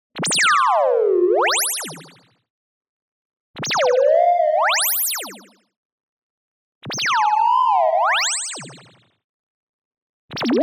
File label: tonal, two oscillator frequency modulation bubbly long; boom library; Anime Essentials